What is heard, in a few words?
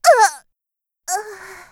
die; Eternal Return; Tia; Voice Line; KOR